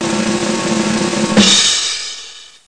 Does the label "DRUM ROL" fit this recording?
Yes